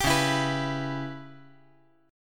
C sharp Minor 7th Flat 5th; strummed; chord; 12,13,12,14; JGuitar

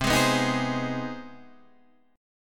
C Minor Major 7th Sharp 5th, Guitar, Standard; CmM7#5 strummed; JGuitar

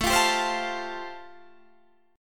G 7th Suspended 2nd Suspended 4th A

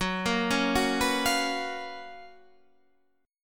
F sharp Major 11th F sharp, Guitar Standard; 14,13,11,11,12,13; arpeggiated

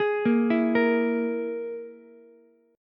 E Major Flat 5th, Ukulele; arpeggiated; JGuitar

